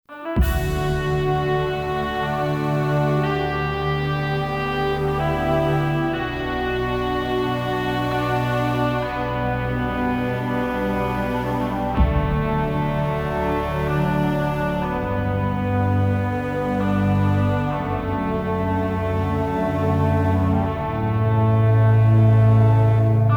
Victory!; Knightshift

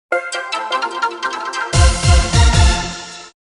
Game Win; Airburst Extreme

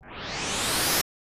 Beam

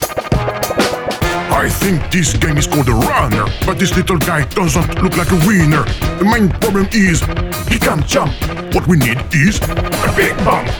Music Rap Runner Hint; There Is No Game: Wrong Dimension

